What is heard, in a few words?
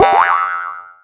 Sheep Jump; Skylanders Cloud Patrol; Arcade, iOS, Mobile